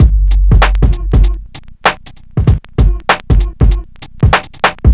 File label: Urban Beat, Drums